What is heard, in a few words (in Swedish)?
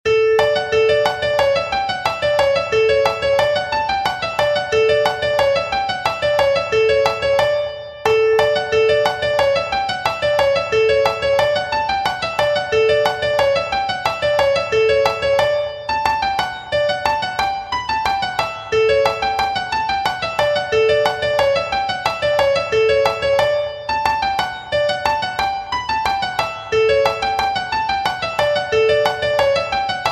springvals; Ulla Stinas